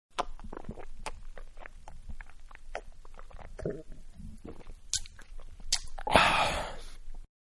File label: Drink Beer Ahhhh!; Drinking; Human; Beer-Drinking And Ahhhh; Manhattan Production Music